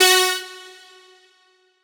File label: Riddim Melodic Hit F# Waltz Synth; One-Shots; Melodic Hits; Ghosthack; Riddim Essentials Volume 2